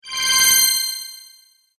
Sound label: Game Shimmer Collect Sound, video game